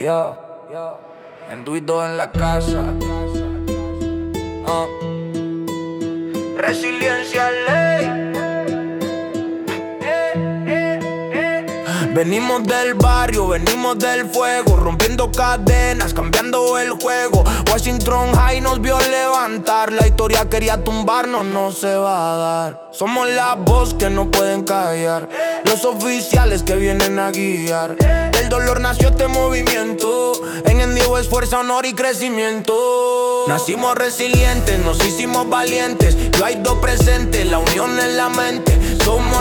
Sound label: Version rap